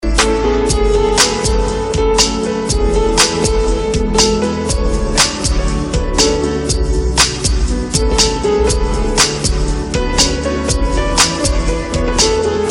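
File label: instrumental; rnb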